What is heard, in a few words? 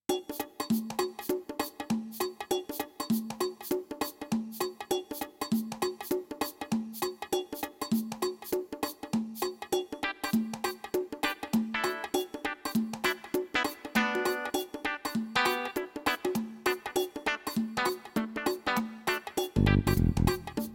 SC880; the incredible machine 2